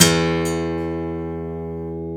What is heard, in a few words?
A GT E1; AKAI S-Series CD-ROM Sound Library VOL-7; A GUITAR 1